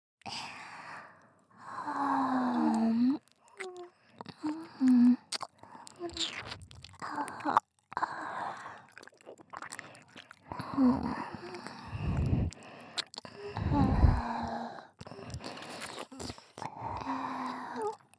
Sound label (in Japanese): 耳舐めオンリー